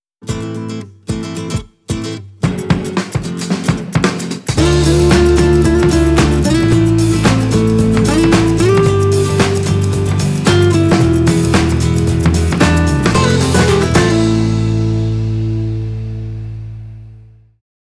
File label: spanish love